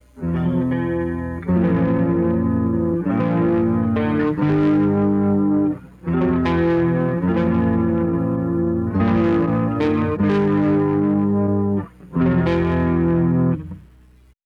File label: riff tapes